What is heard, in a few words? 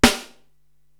SD Amb. Piccolo, Korg TR-Rack drum machine